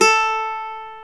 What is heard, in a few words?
A3 MANDOLIN